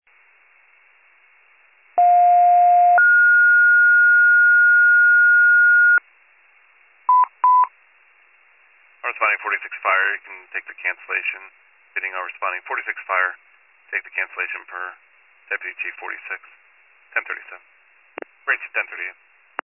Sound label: fire, pager alert